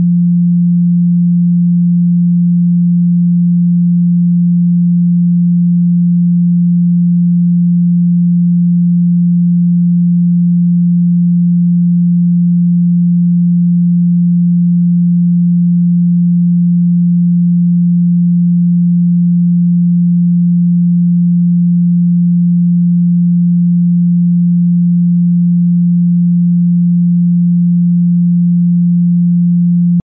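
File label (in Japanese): 174 Hz, ソルフェジオ周波数 信号音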